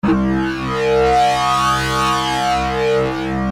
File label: Sweep Ld C